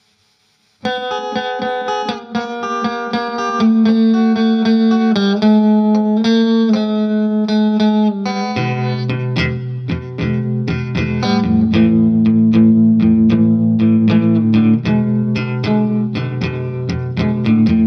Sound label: 12 bar blues in e